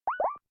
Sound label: Call Depart